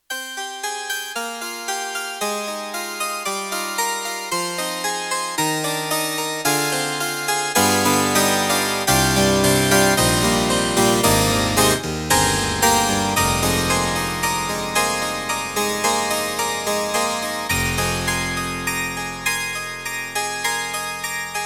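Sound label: YAMAHA MU1000EX Harpsichord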